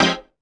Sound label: dance chord